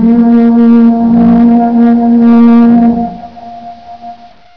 foghorn

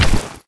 maglite draw

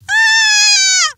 fear, scientist female

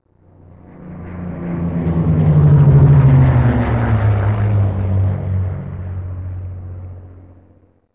plane

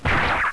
sab hit

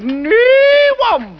womp

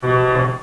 lab klaxon

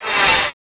ammo respawn

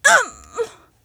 die, female

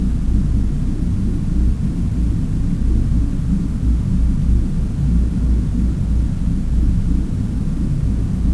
mines

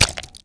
gib bounce; Physics

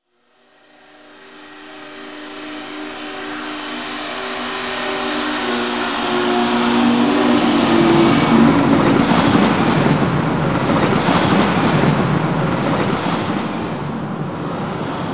train by

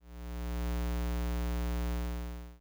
fading buzz

